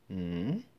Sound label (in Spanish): Dudas